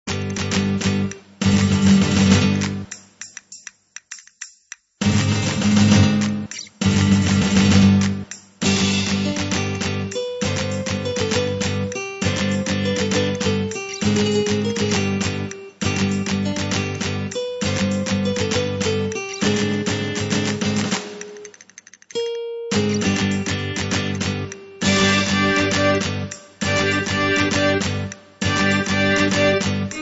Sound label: Small Ensemble; Zorro